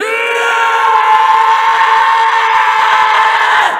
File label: fire zombie; trespasser v2